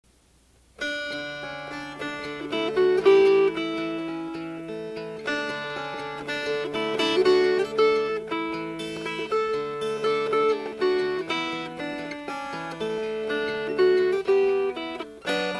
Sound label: Fingerpicked